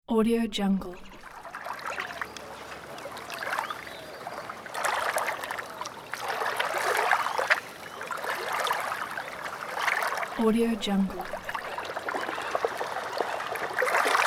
beach waves

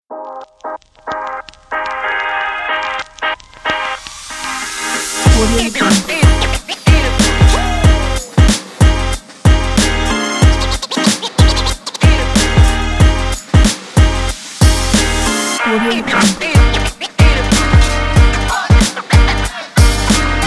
hiphop

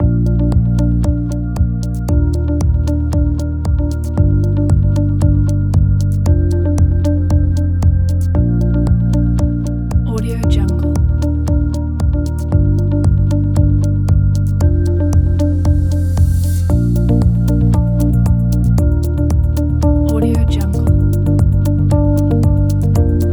inspiring slideshow

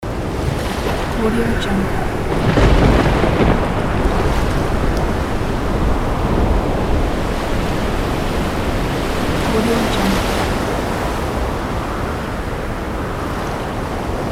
sea storm

